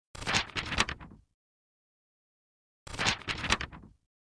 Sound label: Opening paper roll